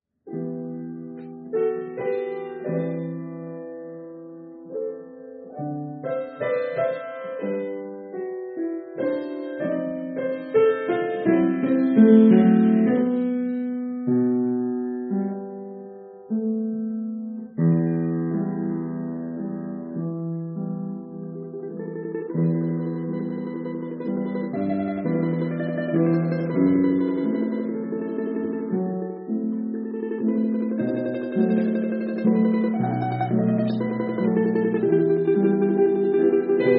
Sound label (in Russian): домра; В. Городовская; Памяти Есенина